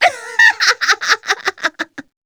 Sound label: succubus laugh long; queen girl